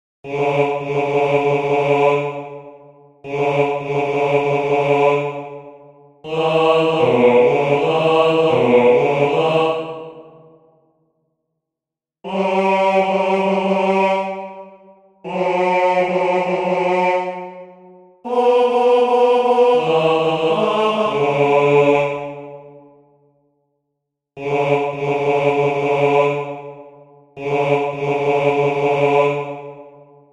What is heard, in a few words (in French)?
basse